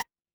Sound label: ui menu